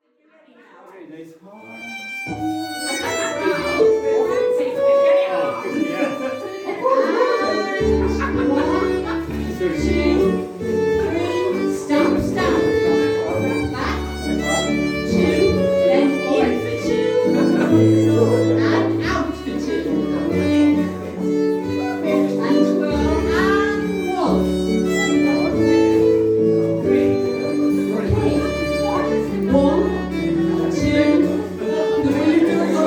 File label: St. Bernards Waltz